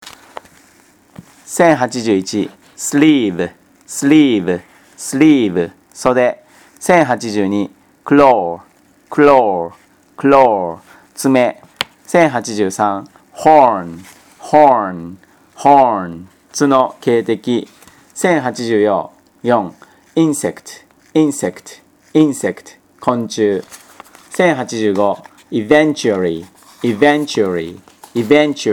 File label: tango